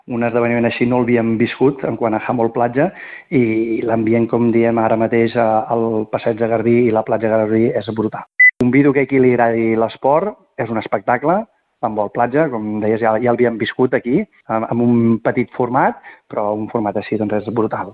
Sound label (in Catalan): ambient; handbol platja; Calella